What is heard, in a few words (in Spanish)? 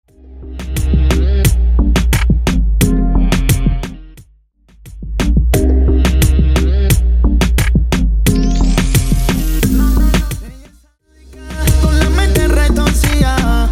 Intro Outro Dirty 88 BPM Cubaton